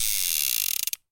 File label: claw; Fluffy Favourites Mix 'n' Win